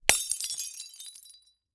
Crash Beer Bottle On Cinder Block Smash; Crashes

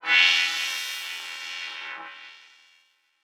Title Transition Feedback Blast

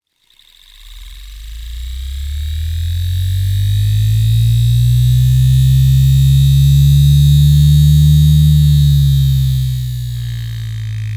Industry Motor Servo Spinning Powerful

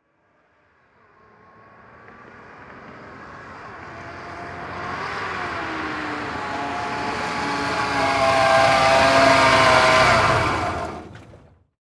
Truck Ford Ranger Drive Up Shut Off Fast; transportation

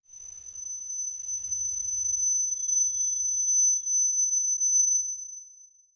Title Transition Digital; Production Element Title Transition

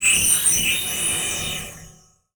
Transition Whoosh Warble; Production Element; Title Transition; AdobeCC

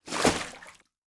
Liquid Water Bathtub Splash

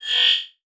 Multimedia Internet CD-Rom Flash Wipe Electronic

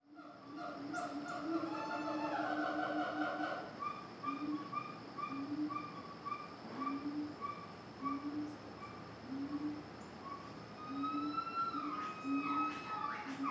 Animal Ambience Monkeys In Jungle; animals; AdobeCC

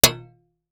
Impact Street Sign Metal Hit By Solid Metal Pole

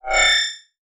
Multimedia Internet CD-Rom Flash Hi-Tech

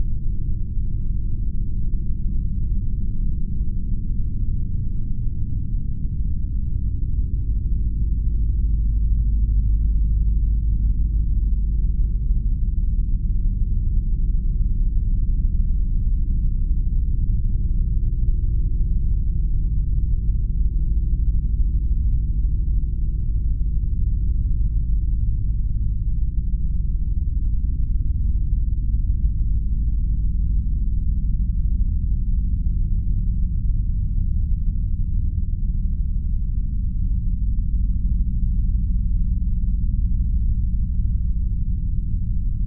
Drone Deep Low, Power Source; Production Element Imaging; AdobeCC

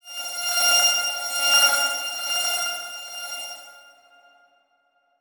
Title Transition Feedback High Pitched; Adobe; Production Element

